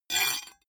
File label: metal rattle short; industrie wirtschaft; metall